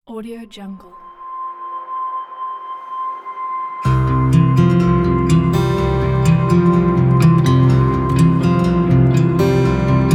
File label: mellow ambient acoustic; everythingwerenot